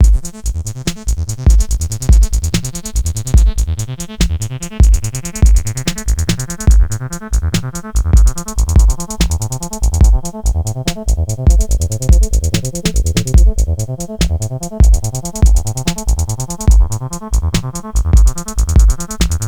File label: loop 72 bpm; 48000hz 24bit